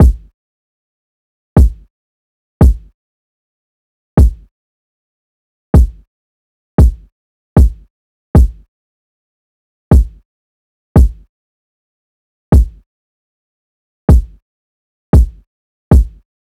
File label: Drum Loop 115 BPM Kick; Loop Stems; Cymatics; DOPE DRUMS VOL. 1; Coffee Drum Loop